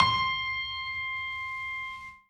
piano melo

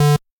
bass

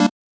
Chord

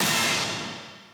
stab

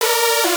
custom synth

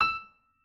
piano

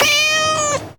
SE GAME EXTAP; maimai

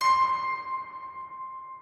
piano

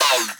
bass3

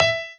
piano melo

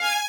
violin

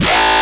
bass

